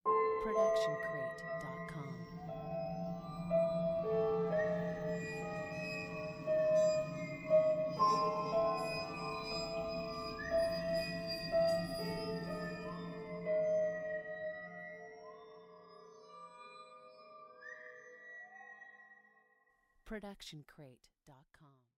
haunting piano; soundscrate

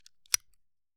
Pistol Magazine; SoundsCrate

